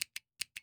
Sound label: Click Button Arcade 109 bpm; Loops; Drums; Percussion; Ableton Live 12 Lite; Core Library